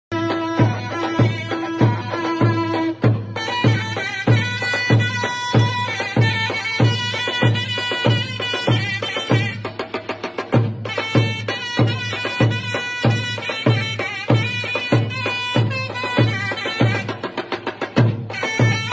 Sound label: saz hakhamaneshi, بختیاری; ساز هخامنشی